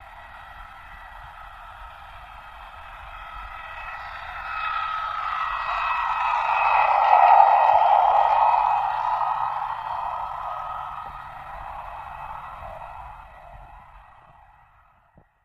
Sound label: Torpedo Jet By, torpedos